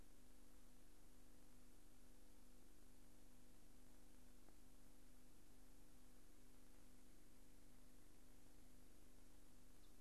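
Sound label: australian koel male female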